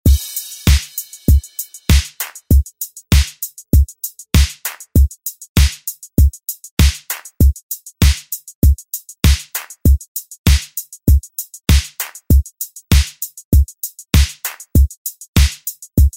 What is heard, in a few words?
ReDrum; DJ Mhark